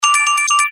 tono mensaje

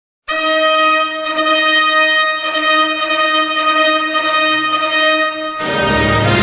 himno nacional guatemala

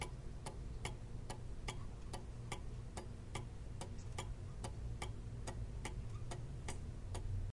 reloj manecillas agujas

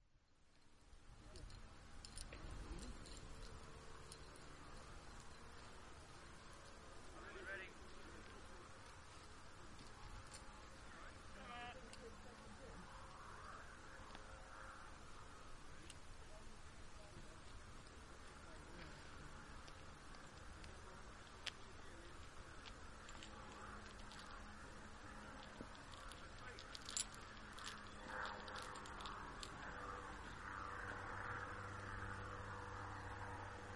aeronaves

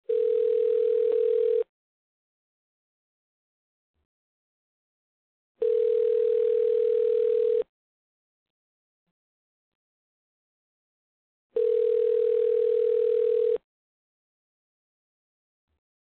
telefono

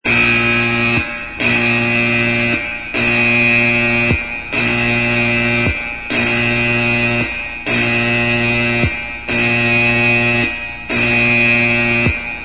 sirena sismo

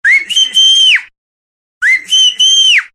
silbar chiflido

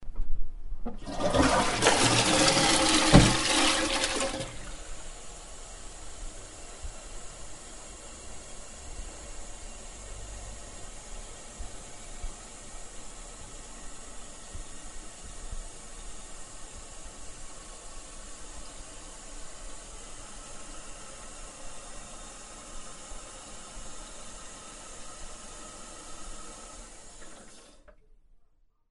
lavar

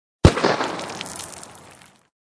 explosion bajo agua

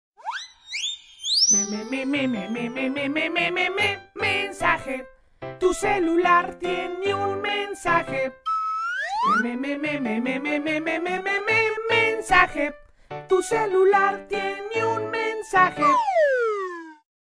graciosos ringtones tono mensaje mememe

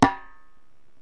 tambores

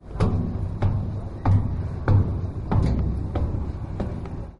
descendente

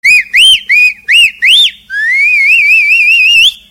chiflido chiflido

